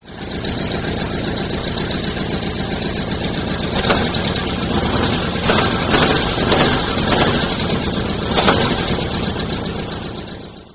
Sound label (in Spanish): motor vocho ringtone; android